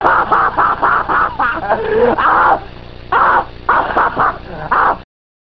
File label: stupid guy laugh; bodydonnas